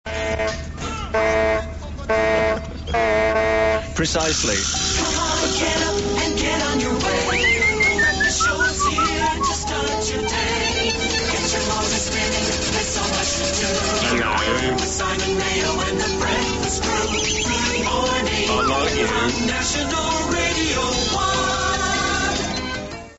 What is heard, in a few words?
honking pips jingle; simon mayo